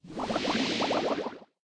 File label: Btl Hitlrng Bubble